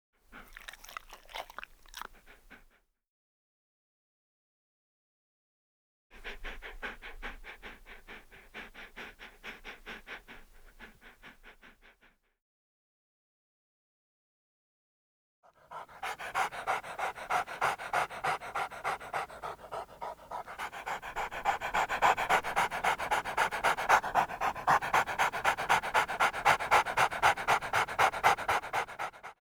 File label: Animals Birds; Sound Ideas 6003